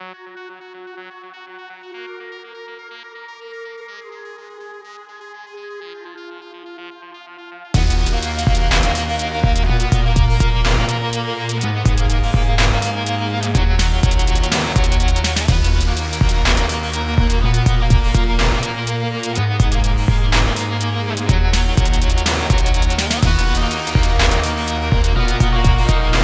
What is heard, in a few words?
Stand Out Partial Vocal Mix; Anger Music Library